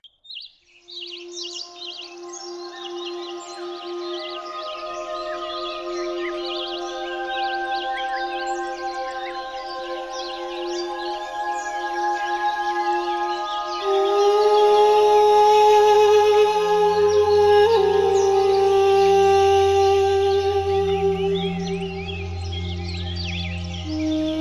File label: Music With Nature